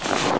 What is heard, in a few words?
snow, player